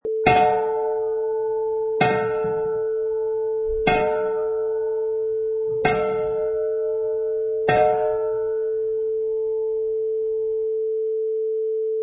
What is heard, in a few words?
Big Old Bell Multiple